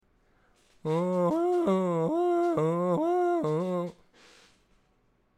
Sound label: Yodel male